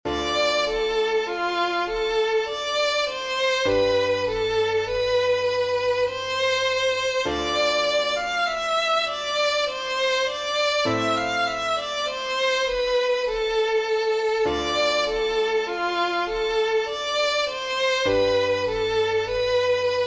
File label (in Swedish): polska